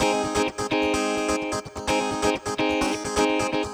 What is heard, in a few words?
Electric Guitar 128BPM G# maj; Vengeance Samples; VEH3 Electric Guitar Kit 1